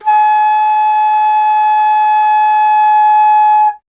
Alto Flute

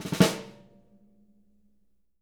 snare ruffs, JZ XSNARES; Sampleheads; Peter Erskine Living Drums

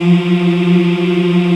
African Mm m; Roland LCDP11 Africa VOL-1

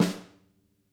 SNAR MED, Interactive Drum Kits; Sonic Reality; ID SNARE 1+

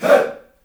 "HEY", Heart of Africa, shout; Spectrasonics; Heart of Africa 1; CH. SHOUT1

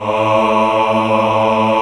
cho A1, Choir Male AH, AKAI S-1000; Retro Sampler

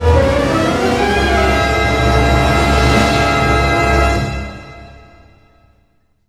GRO, Orchestral Loops; 128 HITCHCOCK

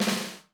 BUZZ, 30S JAZZ; Northstar - New Gold VOL-1

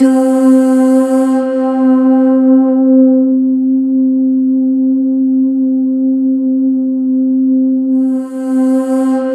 C5, Synth Leads

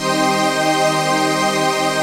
CHRD PAD; Best Service; XX Large Pads; Sample CDs

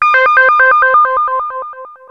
ARP 2600 D#3, synthesizer; Hollywood Edge - Giorgio Moroder Rare Synthesizer Collection

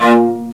TRAMBASS, Fairlight CMI, STRINGS; STRINGS2

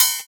Closed Hat, JUNGLE BREAKS; ORIGINAL JUNGLE BREAKS; JBK DRUM SOUNDS; JBK HATS

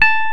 Electric Pianos; OMI - Universe of Sounds; EII Factory Library

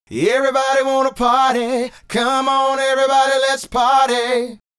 Male Group, Hip-Hop and RnB Vocals

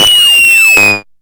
NOIZ FALL, Hard & Loud Techno; USB Soundscan vol.01; AKAI

